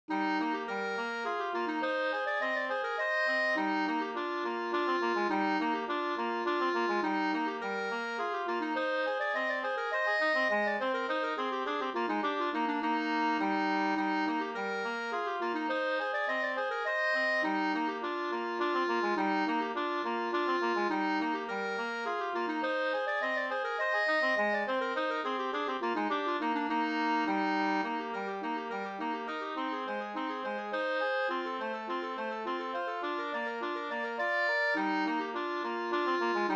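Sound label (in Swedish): slangpolska, two-part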